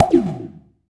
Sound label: BOING; Distored Reality